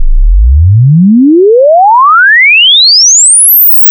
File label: 20 hz 15kHz